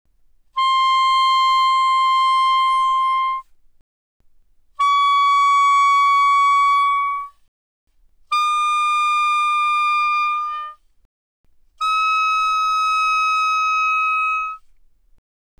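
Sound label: Soprano Sax No Vibrato mf C6 Eb6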